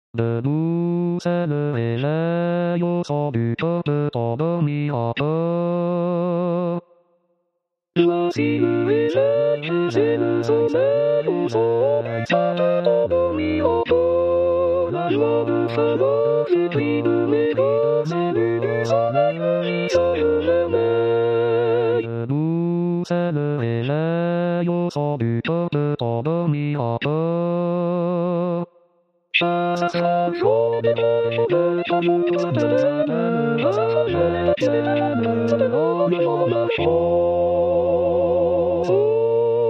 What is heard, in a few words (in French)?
choeur; Synth; Chasse